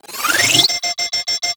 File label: Shield Lancer Pistol Alt Charge C; Warframe; Lasrian; Lasrian Soldiers; Weapons